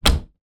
household wardrobe door close; zapsplat

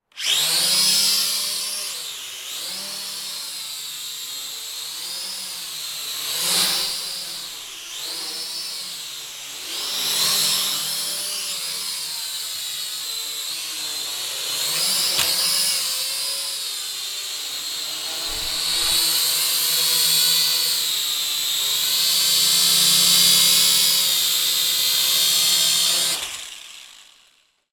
leisure drone launch fly pass by manouvers land; syma x5sw

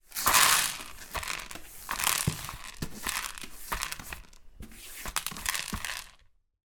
food popcorn kernels sweep up from kitchen floor; zapsplat

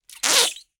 cartoon squeeze squelch fart

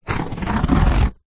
foley cardboard single scratch sharp object

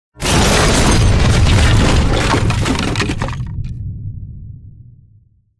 bomb drop massive explode debris

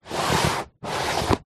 cardboard box parcel slide on wooden floor; foley

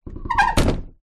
household door front close squeak; zapsplat

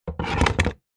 warfare gun handgun pistol set down on wood; zapsplat

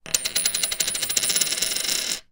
money coin x1 australian down on table spin; foley; zapsplat

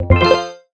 Jingle Win Synth; little robot sound factory

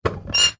household toilet roll holder wall plastic squeak; zapsplat

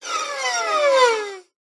cartoon descend violin raw badly played

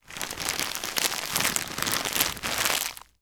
household nappy bag pack movement

